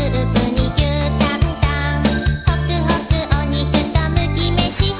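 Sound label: guitar; svencoop; rhapsody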